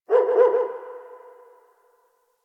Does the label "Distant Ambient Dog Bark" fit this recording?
Yes